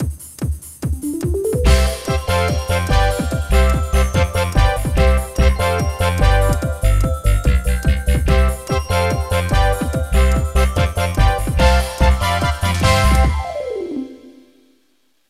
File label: Race Win; Kart Race Kimete wa Drift; psx